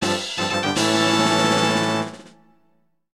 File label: Small Item Catch; OoT